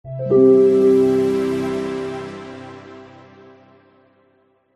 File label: logo ident warm tranquil calm